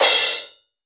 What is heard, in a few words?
Crash Stop, Cymbals; Roland BK-5